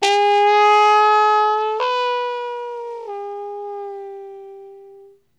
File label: TRUMPE, Trumpet FX; Roland - LA Composer vol. 2